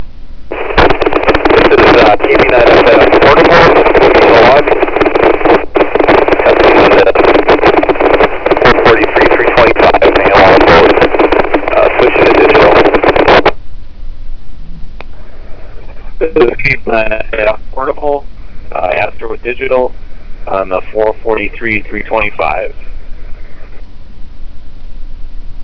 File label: weak p25